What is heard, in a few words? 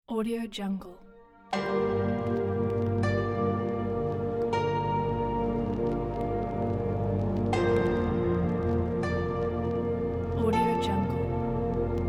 china soundscape